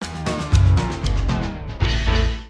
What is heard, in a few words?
Music Duel Fail Ending; Costume Quest 2